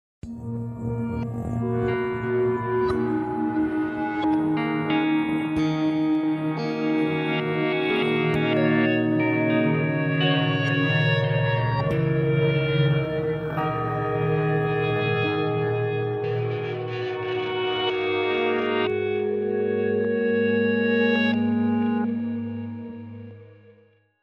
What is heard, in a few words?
REVERSE